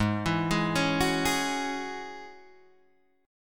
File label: G sharp 7th Suspended 4th Sharp 5th G sharp; G#7sus4#5 Chord {4 7 6 6 7 4} arpeggiated